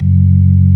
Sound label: MID FAST, ToneWheel Organ; E-MU Formula 4000 Series Vol. 3; Analog Odyssey